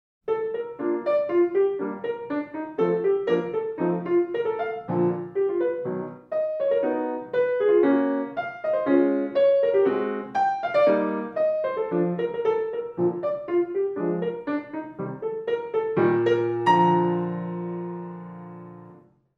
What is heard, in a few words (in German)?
folkloristisch